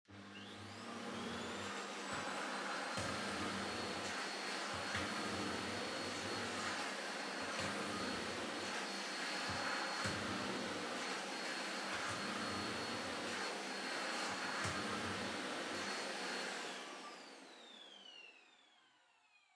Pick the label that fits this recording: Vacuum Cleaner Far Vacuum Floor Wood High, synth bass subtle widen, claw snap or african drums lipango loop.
Vacuum Cleaner Far Vacuum Floor Wood High